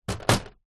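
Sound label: electronic typewriter set down on wood desk; office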